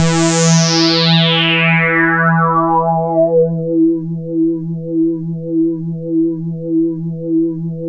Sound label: Juno sweep, synths; E-MU Formula 4000 Series Vol. 3; Analog Odyssey